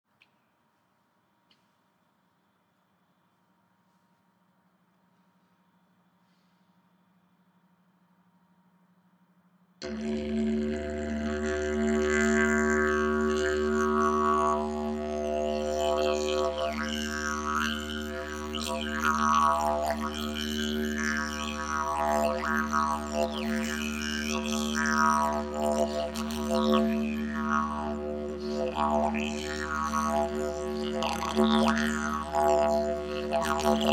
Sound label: Didgeridoo - Alpha Binaural Beats